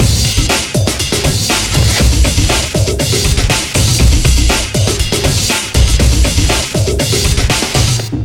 heavy drums

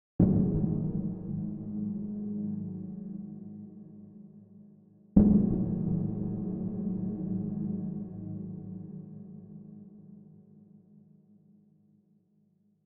Boom

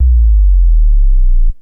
Boom

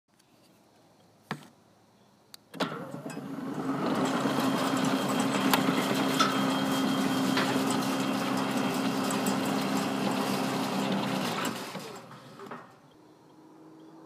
Gate Opening Consolidated

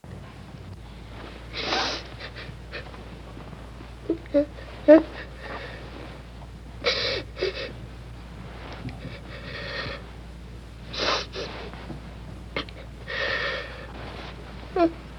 Child Crying

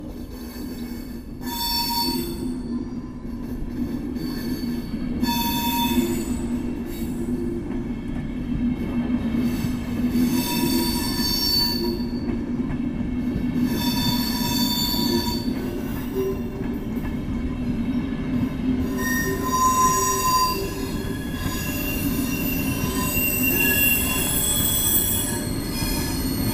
Train

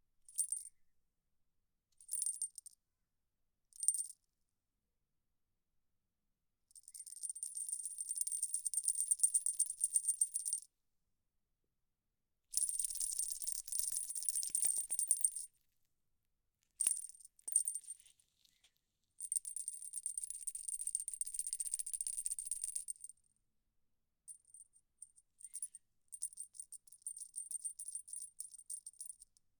Tinkling Of Small Bell

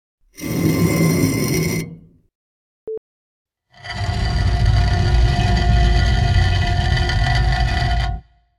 Brick Becomes Slab

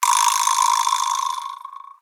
Vibraslap Large

